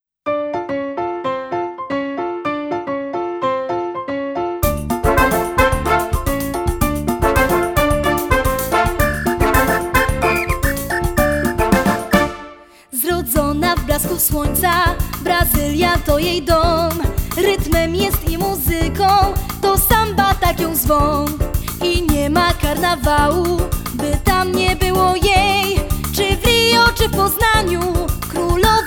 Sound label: Samba